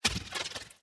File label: teratoma bounce small